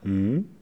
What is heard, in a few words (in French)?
hum doute